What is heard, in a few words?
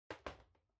Electric Fan (Far-Put Down)